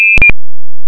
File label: ccitt Break; FoneTone2000